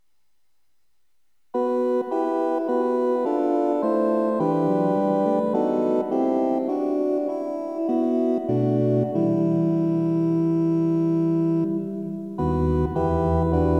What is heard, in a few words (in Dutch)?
Orgel; Ik wil mij gaan vertroosten